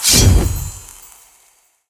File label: activate Walking Wild; netent; narcos